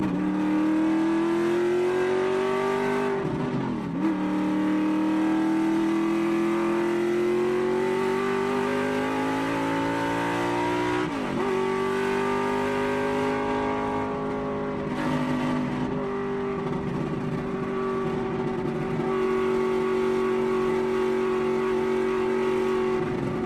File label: car accelerate; lightning speed